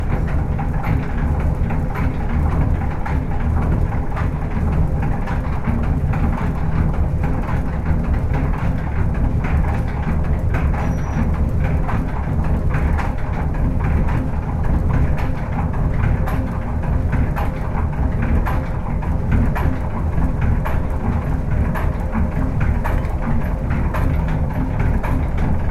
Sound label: mill machinery operating; caudwell s mill rowsley